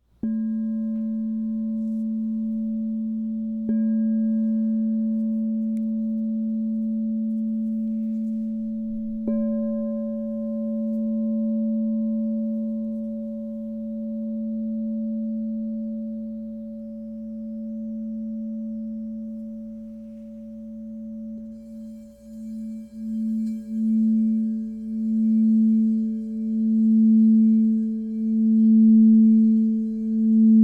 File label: Crystal Tones Singing Bowls